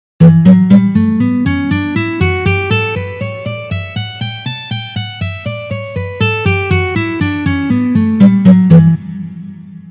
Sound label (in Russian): Gamma Re mazhor Tri noty 2 pozitsiya